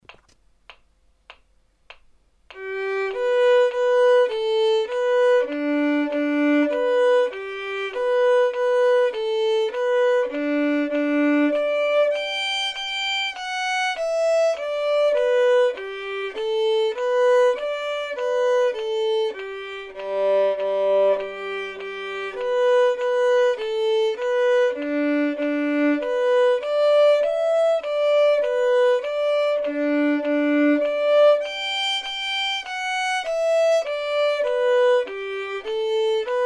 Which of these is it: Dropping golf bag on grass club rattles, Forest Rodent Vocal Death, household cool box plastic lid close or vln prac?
vln prac